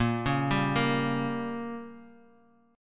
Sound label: A sharp Minor 6th, down, Guitar, Half Step Down